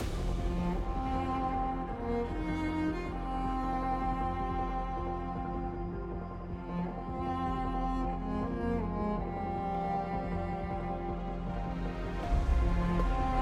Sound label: mood loop triumphant